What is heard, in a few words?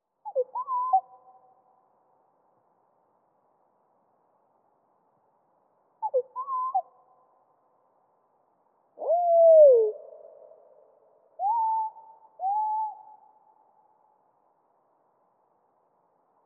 PALLAS; TROIS OCTAVES EN DESSOUS